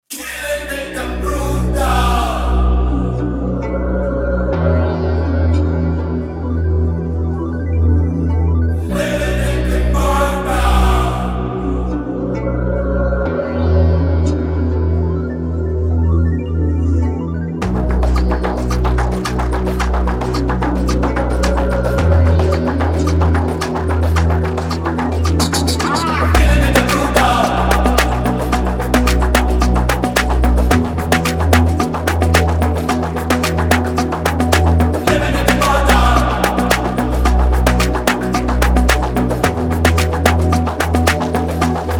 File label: Hindi Afro House Mix